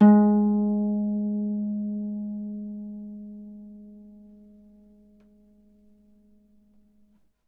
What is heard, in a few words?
Harp G#3; Miroslav Vitous - Percussion & Harp